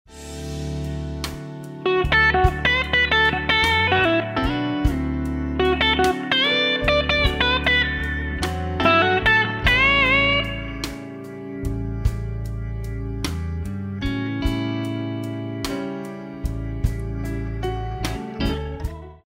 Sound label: Blues Lick, guitar; Blues Guitar Method 1